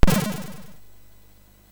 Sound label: Bomb Chase Revival SFX, sid MOS6581